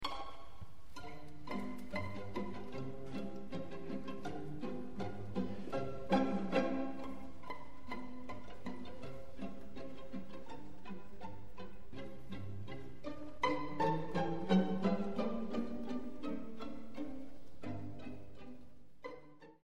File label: Pizzicato Polka